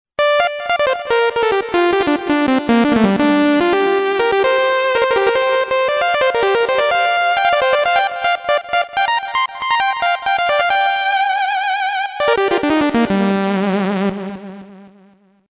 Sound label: moog